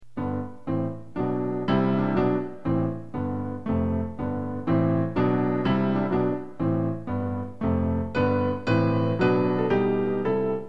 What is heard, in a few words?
Playing Piano